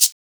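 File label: PERCUSSION; 727; E-Lab; X Static Goldmine 1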